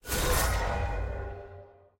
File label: magic; diana